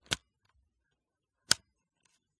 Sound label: smena symbol camera crank pull and collapse; dpa4060; pmsfx